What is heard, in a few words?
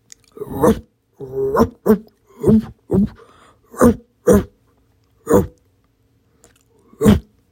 big bark; grimmcubus